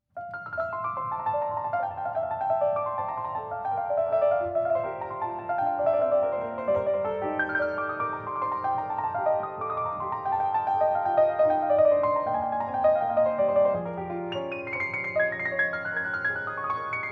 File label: mazurka